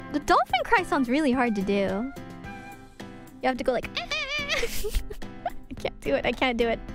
dolphin cry

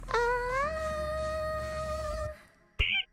aaaaah; IRyS

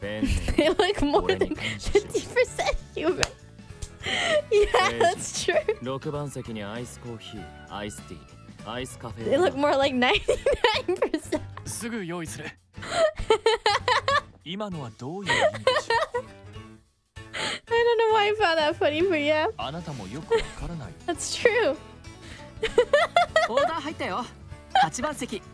Laugh; IRyS

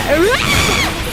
Scream; died by a gundam; IRyScream

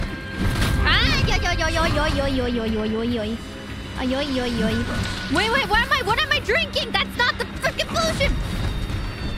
aaaaa yoi yoi yoi yoi

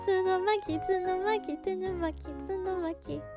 Singing; IRySinging

